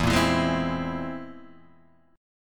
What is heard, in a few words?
C Augmented 7th E